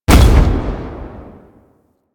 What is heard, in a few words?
Army Proj Explosion; Warframe; Warframes; Hoplite